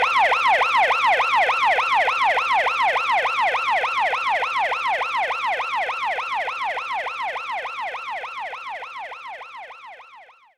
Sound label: Sfx Darts